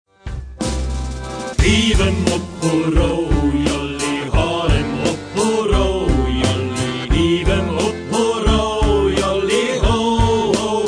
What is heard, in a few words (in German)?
Fischshanty